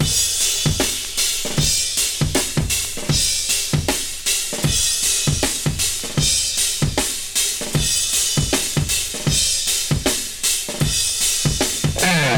78 bpm rock drum beat d key, Free breakbeat - kick tuned to the D note. Loudest frequency: 5526Hz